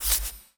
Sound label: find match change options, ui